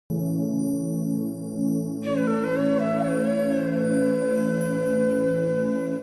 Buddhist flute; فلوت بودایی

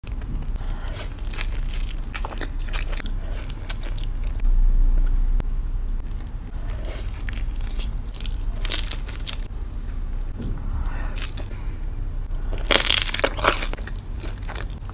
fast food, خوردن

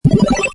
arcade bleep sound

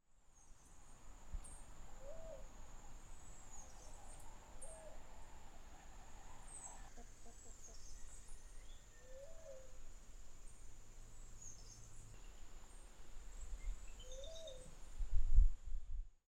zenaida doves; کبوتر زینایدا

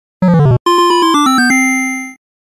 Game Over Sound Effect

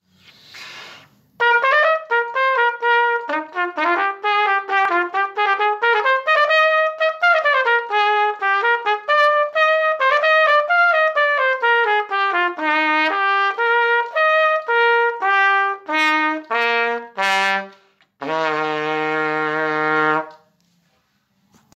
Cornet; کورنت

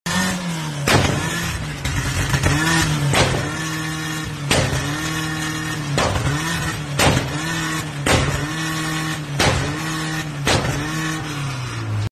Bee exhaust

